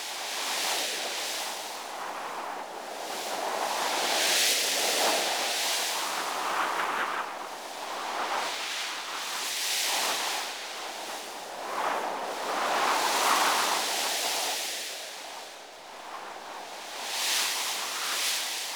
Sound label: Exterior Wind Bed Loop; Warframe; Sentient Devourer; Ambience